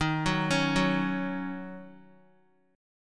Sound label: Eb Minor 7th, down, Guitar; arpeggiated; 4E,4A,5D,5G,5C,6F; chord; JGuitar